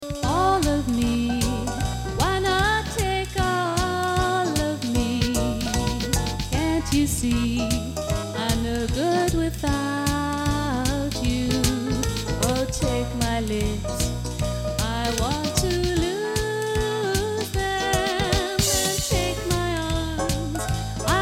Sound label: Jazz